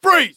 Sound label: freeze; Invade&Annex Altis; tactical